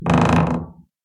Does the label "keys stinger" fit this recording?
No